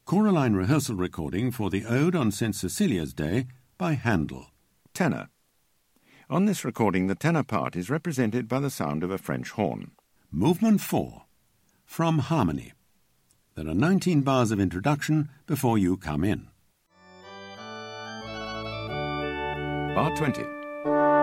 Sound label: Tenor; Handel Ode St Cecelia